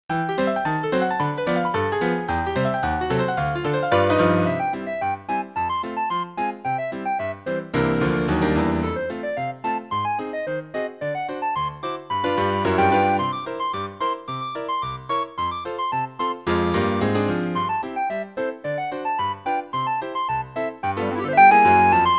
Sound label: charleston for clarinet and piano